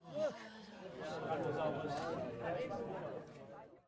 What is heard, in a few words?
crowd Reaction jungle small Reveal; spellbound